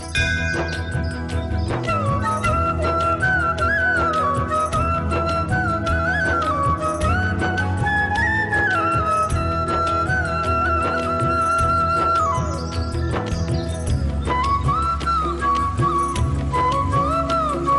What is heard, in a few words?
Flute; Bal Krishna